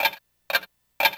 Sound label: timer; wario